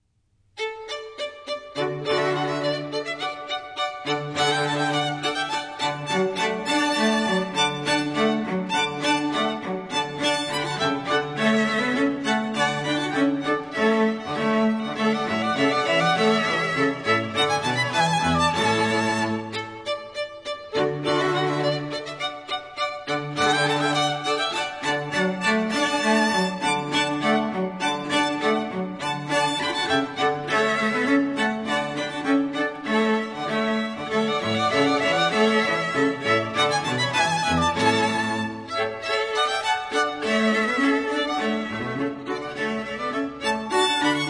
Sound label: Quartet